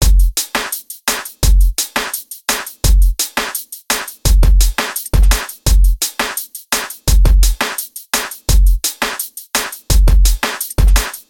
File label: Full Beat